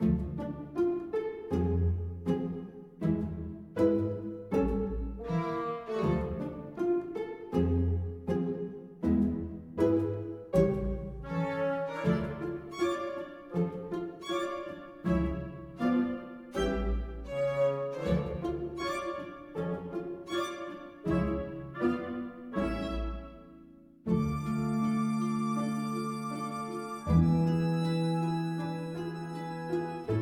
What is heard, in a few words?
office unrest; code shifter